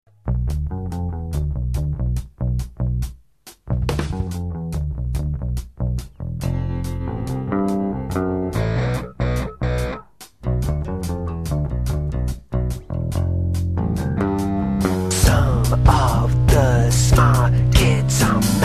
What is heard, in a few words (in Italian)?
intervallo